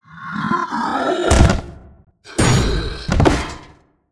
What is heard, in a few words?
Orcus death; Karutan